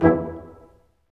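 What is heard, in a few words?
Large Ensemble, Brass Staccatos; Roland LCDP08 Symphony Orchestra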